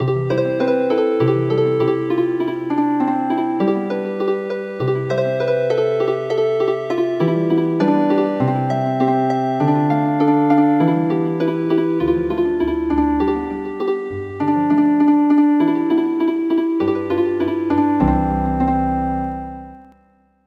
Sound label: Healin Harp; Two Roosters